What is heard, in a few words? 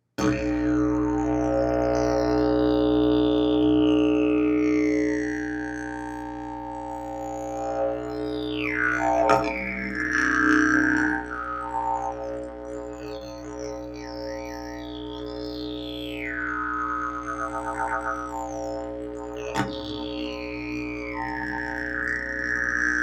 didge